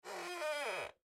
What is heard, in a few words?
Creak Squeak; Creak Squeak Long; Creaking (Sound Effects:Objects); Blastwave; Foley